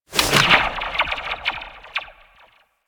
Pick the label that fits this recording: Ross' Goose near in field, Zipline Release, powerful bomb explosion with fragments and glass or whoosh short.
Zipline Release